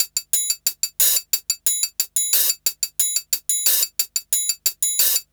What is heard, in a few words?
Hi Hat Loop